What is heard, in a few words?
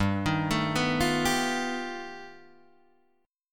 G Augmented 9th, Guitar, Open D